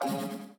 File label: reset; CrossCode